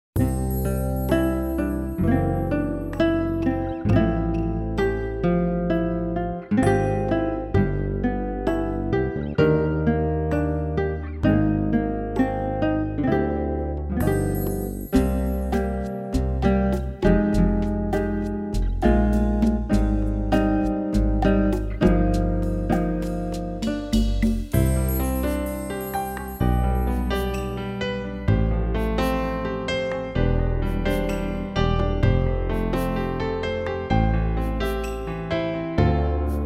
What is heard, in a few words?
acous